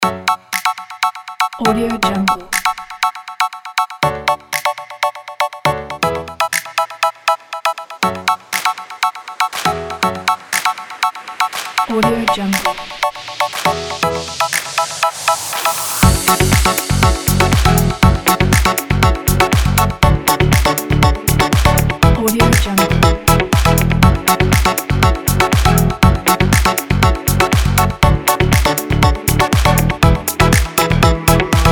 disco kit

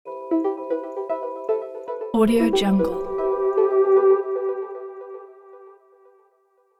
electronic tech logo

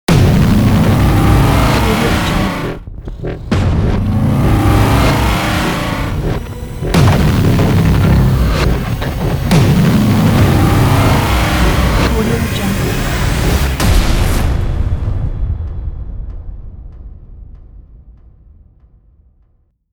cinematic logo ident

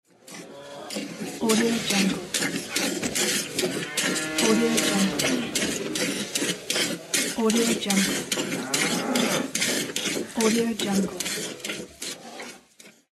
milking cow; nerso-77

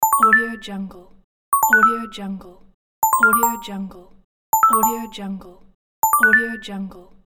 completed

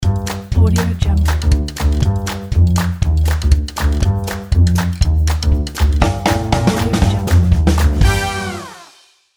the fun logo

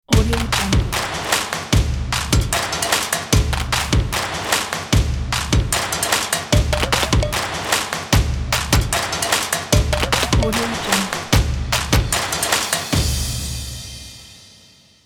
stomp clap percussion ident; musicalwave

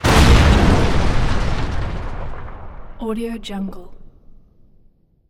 huge explosion

